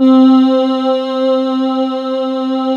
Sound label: AH VOX C3, Choir; USB Soundscan vol.28 - Choir Acoustic & Synth; AKAI; AH VOXST